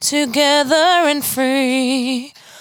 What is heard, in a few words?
92bpm Bb, Velvet Vocal; Zero-G